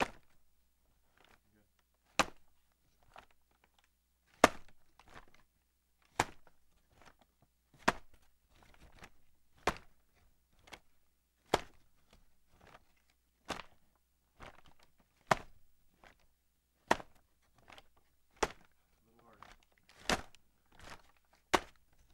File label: Bag Drops Multi Take